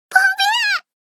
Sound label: girl sounds